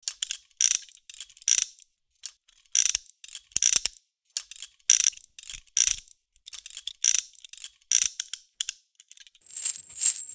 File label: cuffs